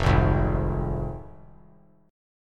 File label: F Minor F down; Fm strummed; JGuitar